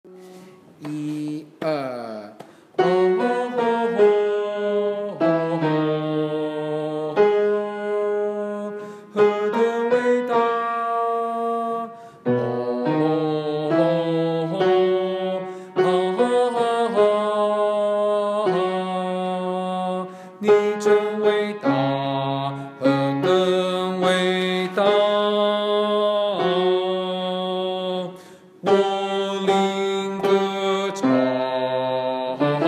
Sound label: bass